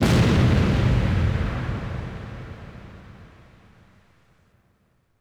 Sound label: normal hitclap